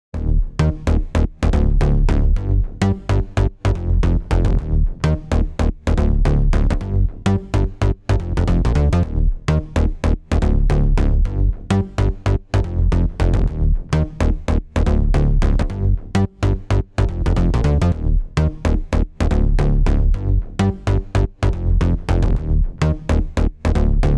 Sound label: bass sculptor on; neutron 5